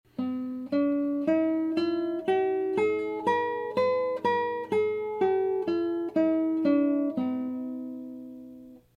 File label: scale, classical guitar